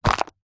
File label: plastic disposable cup squash crush; household; zapsplat